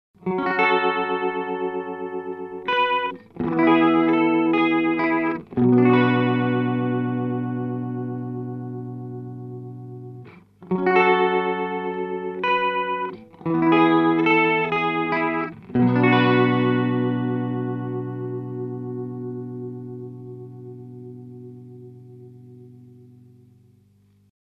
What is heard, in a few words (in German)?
Chorus schnell Sound A